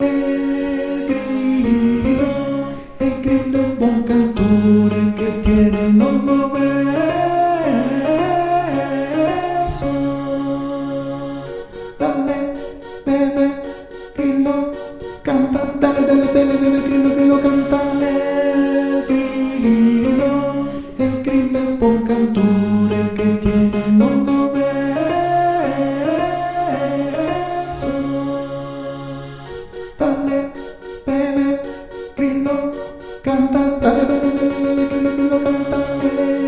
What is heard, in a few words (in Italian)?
El grillo Tenori